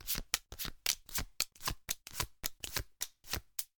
card hand out

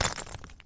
stone break

